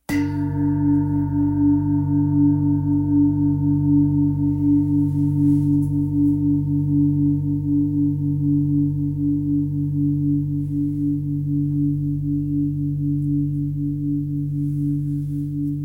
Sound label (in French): Gros bol re 10.66kg; Bol chantant gros volume 4kg et +